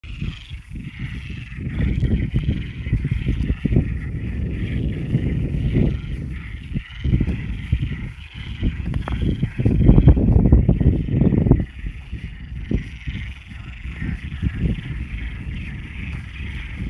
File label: Frogs; walking blog; portugal